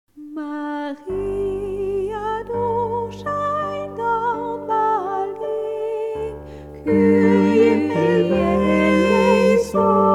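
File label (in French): Toutes Voix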